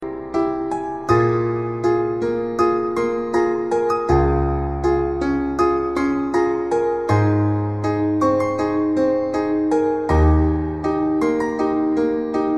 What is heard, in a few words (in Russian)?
melodiya fortepiano